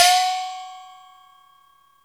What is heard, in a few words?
HI THAI; Best Service Advanced Media Trax Modern Composer